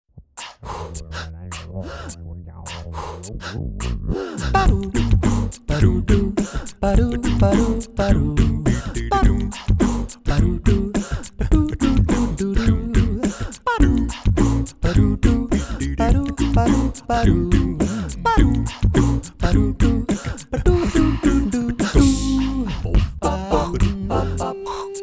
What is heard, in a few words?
Funky Breath